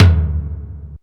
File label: drumcomputer, EMU SP 12; Retro Sampler; EMU SP 12+1200